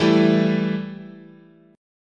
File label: E Minor Double Flat 5th, chord